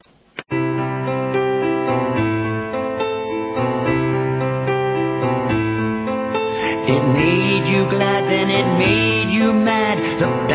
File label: Hallelujah (Piano Concerto in B Minor)